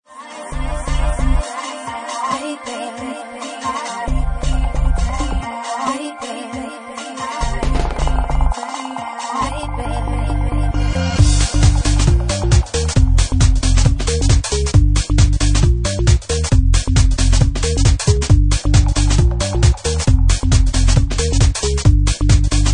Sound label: Organ Dub